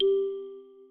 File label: Class Electronic, notifications; OnePlus 12